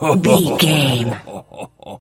voice male laugh short

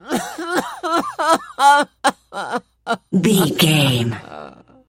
voice male cry high

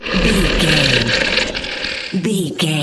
troll monster growl long

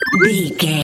collect item sparkle pop

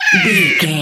goblin fairy hurt pain

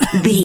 voice male cough short

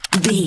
gun submachine auto magazine unload

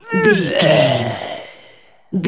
voice male grunt pain death

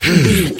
voice male effort short

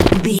footstep dirt land

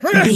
voice female attack

